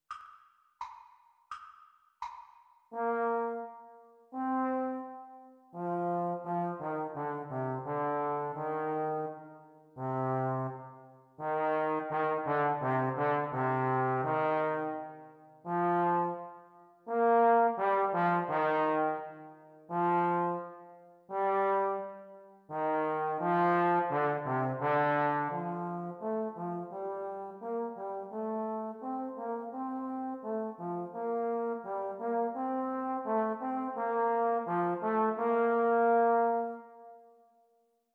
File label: Playalong for Eb Horn Exam Speed; Eb Tenor Horn duets; The Teacher part